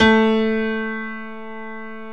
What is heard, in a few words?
A3 PIANO